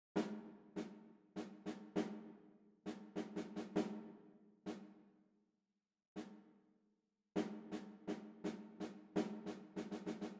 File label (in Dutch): tentamen ritmisch, solfege